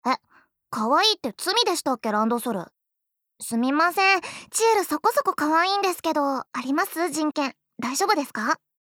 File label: applause